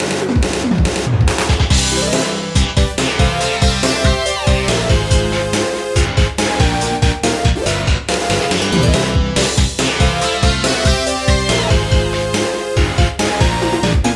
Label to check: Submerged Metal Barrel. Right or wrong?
Wrong